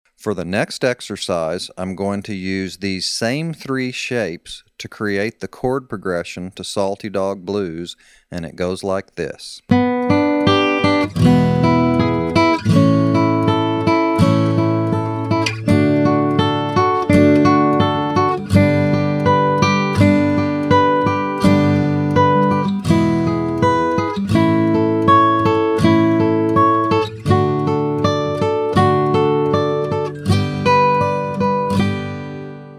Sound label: Chord Progression, Guitar; Big Book of Crosspicking